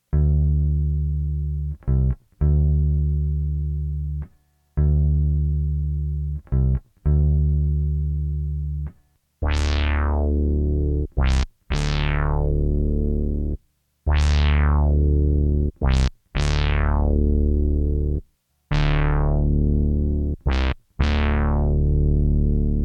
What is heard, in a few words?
akai deep impact, bass